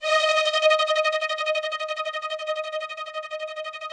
ROUGH STRNGS, Yamaha VP-1; Music Store

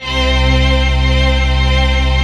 SYM ORCH C 4, Korg; Back In Time Records; Korg Universe VOL-1; Korg SYMPHONY2

